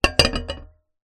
household saucepan put down on portable camping stove